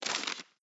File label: page flip